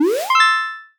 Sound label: powerup on